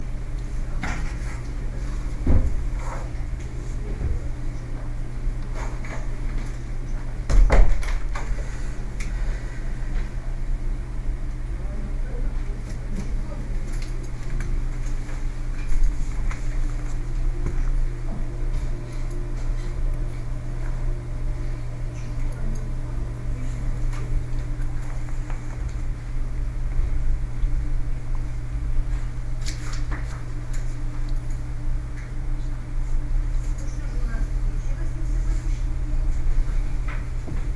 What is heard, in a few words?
library, sergiyev posad; gorlovskogo library